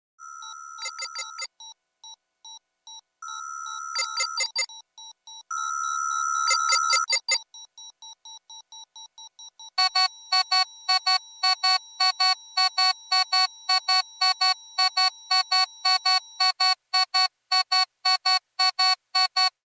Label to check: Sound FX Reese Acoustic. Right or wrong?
Wrong